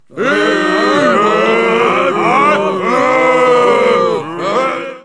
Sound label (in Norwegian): buuu